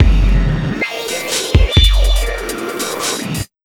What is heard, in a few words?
Big Beat Drumloops, SPEED 140; USB Soundscan vol.25; AKAI